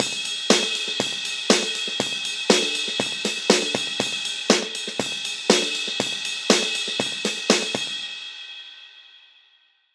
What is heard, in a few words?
Drum loop HPF 250 Hz slope 24